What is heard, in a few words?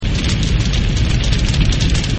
House; Seer; GamigoNA